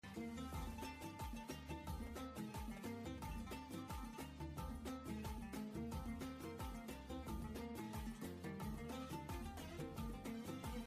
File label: uae bgm